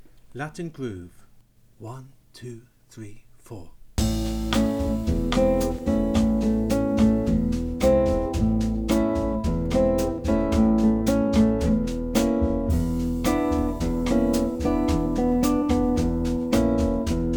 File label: Latin Groove